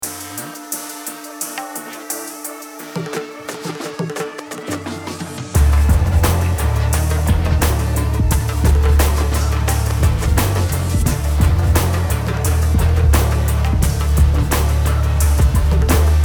trip hop

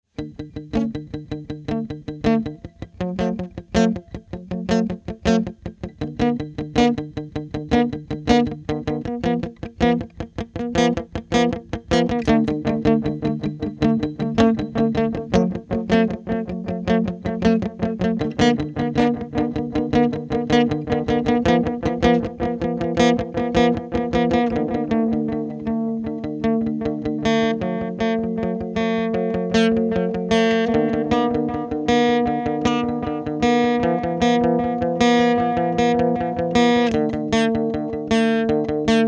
RedFox Delay

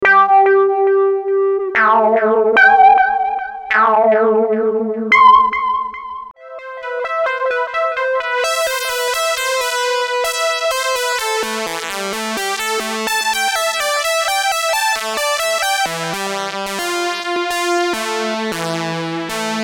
Mini Korg 700S Lead

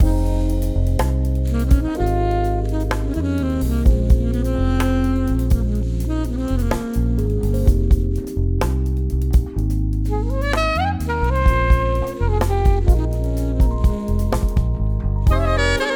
Emphasize Mix

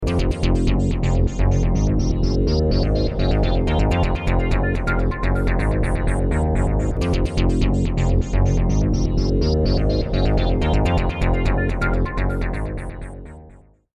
ws seq

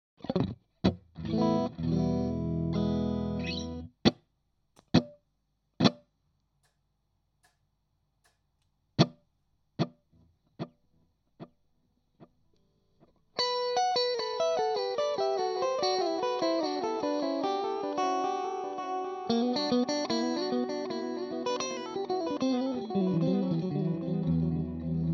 LoFi

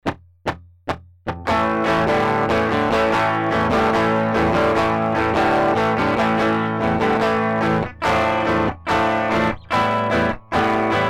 Royer dBooster2 0dB E Guit Fender HiZ

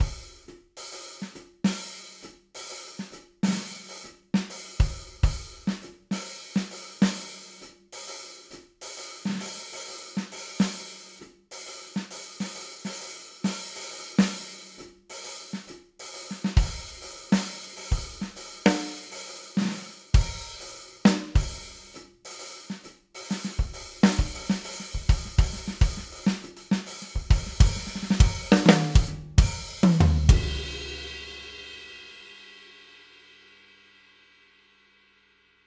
Stereo Mix; EZdrummer 3

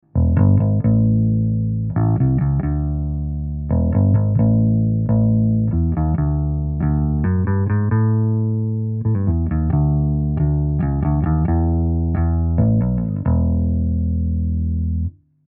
RNT EBass Cardioid minus80Hz

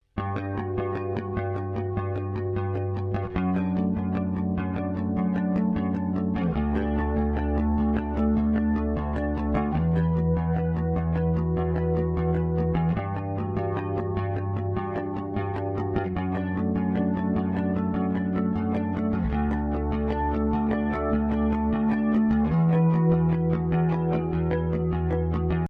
Harmonics

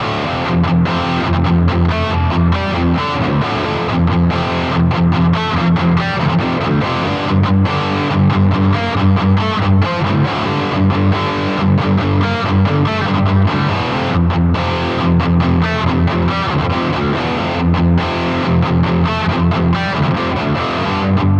Distortion; Boss GT-1000 Core

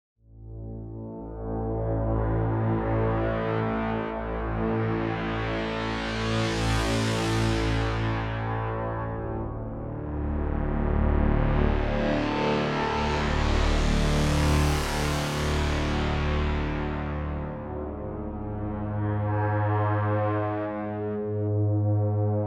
Cinematic; Mayer MD900

